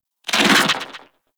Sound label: Infested Rifle Charged 2D Tail; Quanta; Weapons